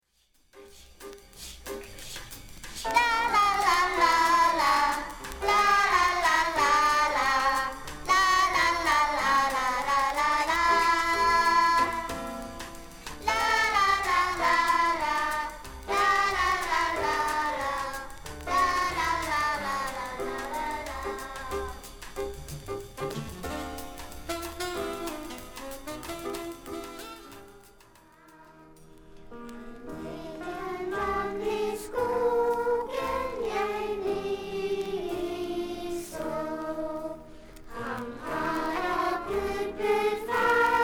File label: 12 bar